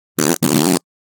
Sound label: Fart Foley - Fart Combination - Big Deal; Hollywood Edge; sound designer's toolkit v2